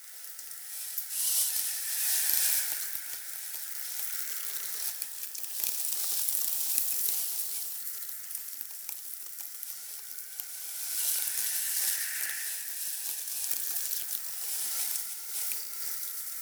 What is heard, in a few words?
Infested Eris Exterior Wind Whistle Debris Spines Loop; Warframe; Ambience; Amb Loops Exterior